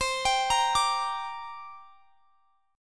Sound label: C 7th Suspended 2nd; C7sus2 arpeggiated; x,x,22,24,23,22